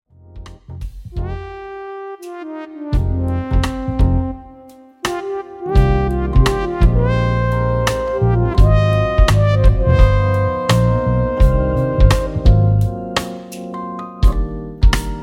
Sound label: LOUNGE MUSIC